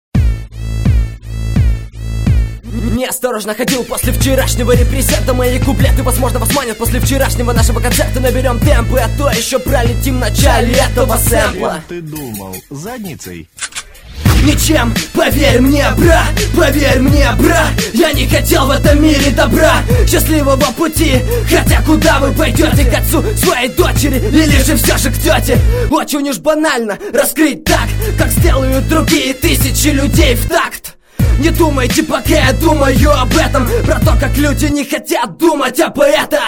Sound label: beat